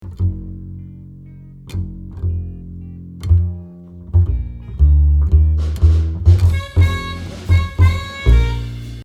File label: bass original